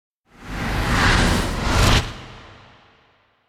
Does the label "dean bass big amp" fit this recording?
No